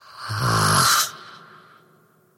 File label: wolf attack emote; dota; crystalmaiden